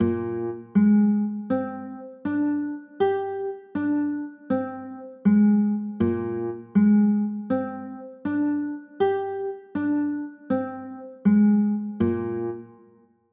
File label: b flat 9, chords